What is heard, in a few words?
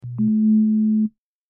Beep Door Beep Tone; beeps